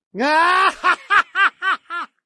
laugh

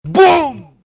boom

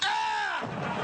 jazz

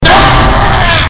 screaming

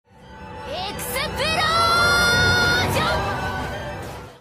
explosions; konosuba

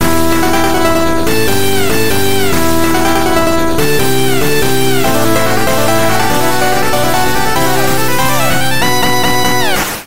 8 bits; flashman

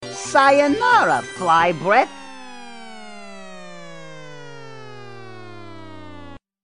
fly breath; sayonara

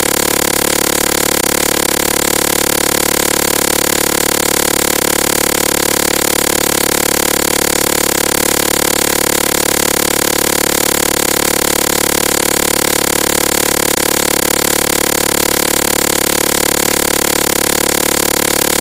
hitmarker

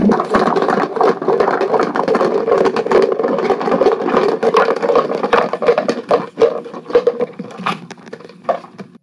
tumbling buckets; unreal

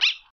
annoying doggo

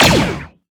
clone pistol

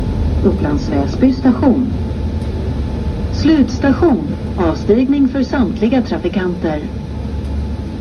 station; upplands vasby

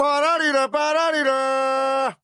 honk honk

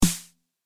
909 snare drum all

